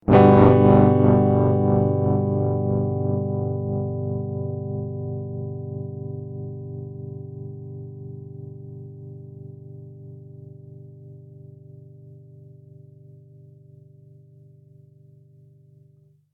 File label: GM 7 down; Hylics